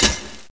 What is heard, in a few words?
hit wall